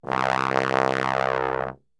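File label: C1 bass trombone, Brass; Best Service; Brass Super Section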